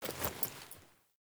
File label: Foley Action Dodge, Default Clothing